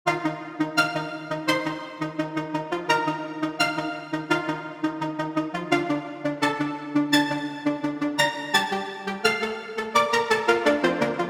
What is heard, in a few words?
Strings; Undertale